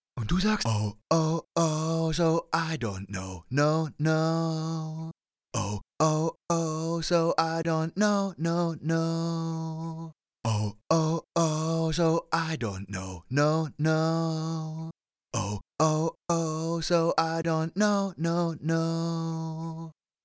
Original Vocals; Soundtoys

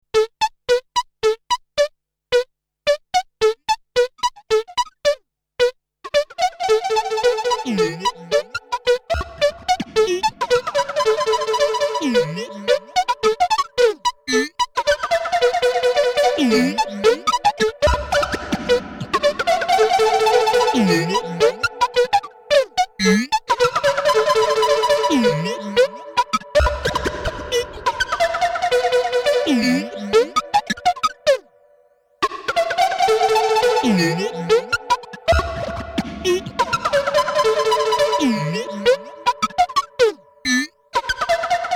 P Locked Delay

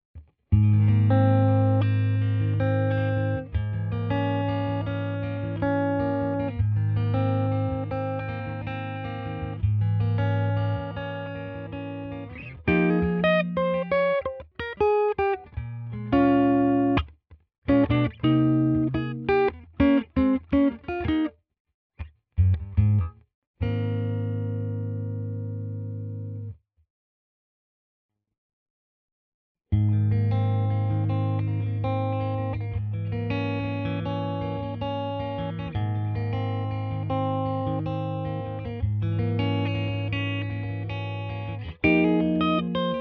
TS Clean Ballad

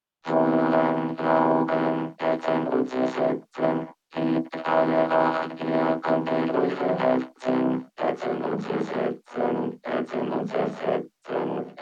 Vocoder V Plus

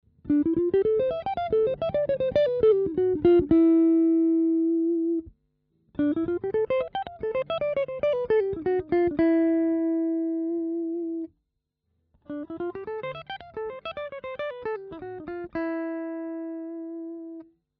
Chapman Jazz Lick all positions

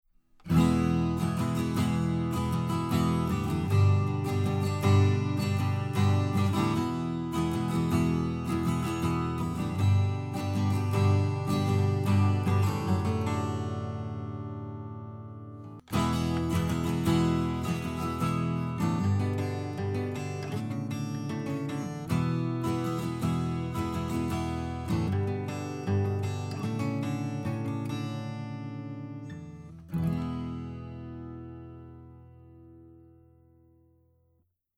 Taylor 12 String Beyerdynamic MC 930 flat; Millennia HV35

